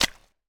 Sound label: pizza walk; pizzaria